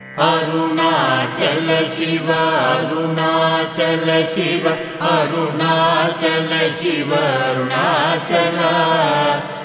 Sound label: arunachala chanting